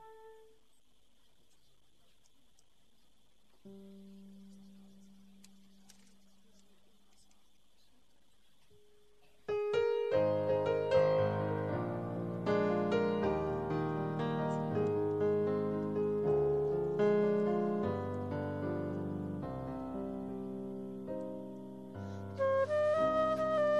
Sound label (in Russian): Музыка; Как цветок полевой; Подростковый поток Апшеронск-2010-B